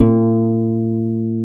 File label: HRP C. HARP; Roland - LA Composer vol. 1